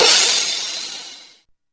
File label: CYMBALS, Disco House; USB Soundscan vol.20; Fresh Disco House I; AKAI